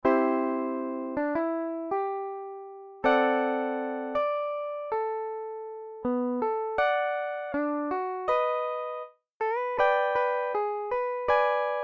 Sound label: clean